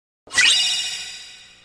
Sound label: item sfx; kingdom hearts ii